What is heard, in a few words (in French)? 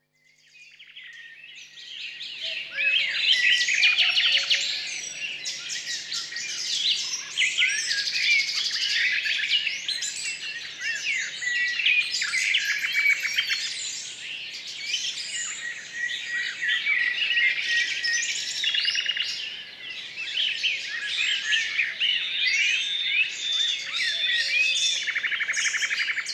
ambiance forestière; un grand bol d'air